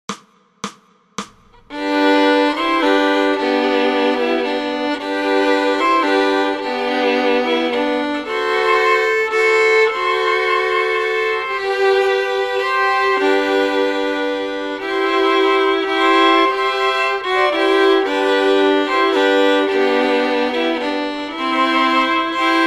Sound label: Fiddle; christmas; Silent Night